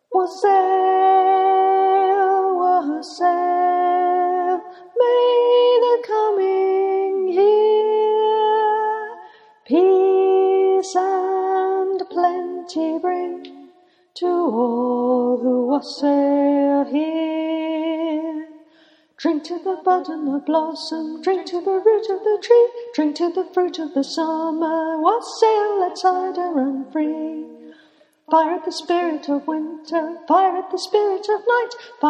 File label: alto